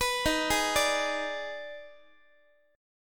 D sharp Major 7th Suspended 4th Sharp 5th, D sharp, down, Guitar; D#M7sus4#5; arpeggiated